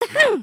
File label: soccer; emotes